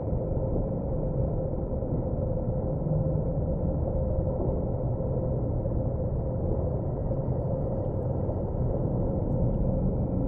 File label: ship construction ship idle; aquatics